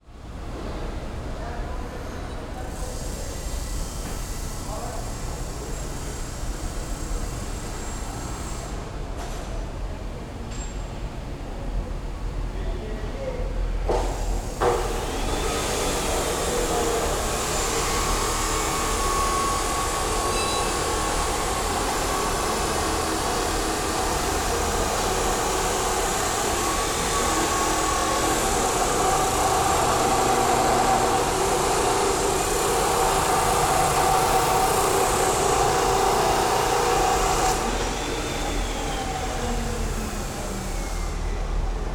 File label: Industrial Warehouse Southern Perspective